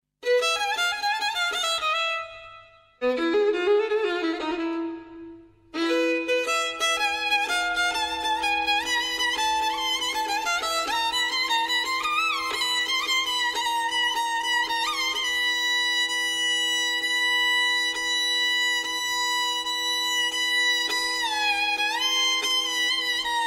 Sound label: Hijazkar violin solo